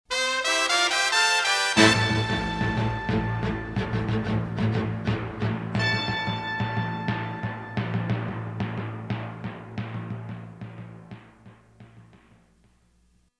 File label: JINGLE NEWS OPEN; WNIR